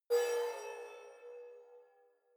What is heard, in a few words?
sci-fi facility ai awake damaged severe, signale; soundpool